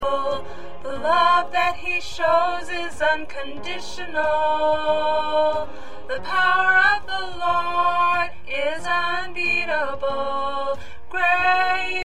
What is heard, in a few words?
Alto, choir